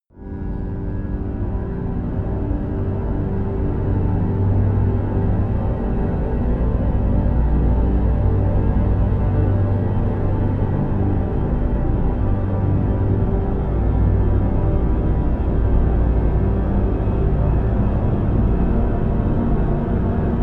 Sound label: drone subtle threat